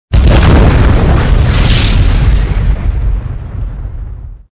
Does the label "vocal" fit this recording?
No